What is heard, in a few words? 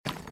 trebuchet sling load; Out of Reach: Treasure Royale